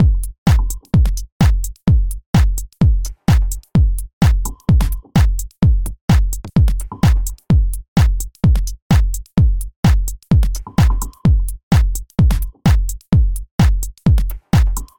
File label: Rhythm Electro Drum Machine House Minimal Fm 128; drum loops